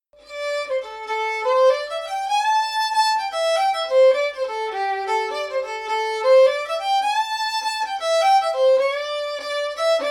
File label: Fiddle